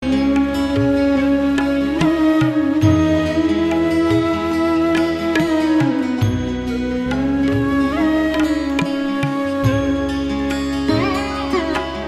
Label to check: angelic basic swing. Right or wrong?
Wrong